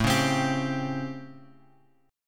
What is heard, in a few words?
A 7th Sharp 9th A; A7#9 Chord {5 4 X 5 X 3} strummed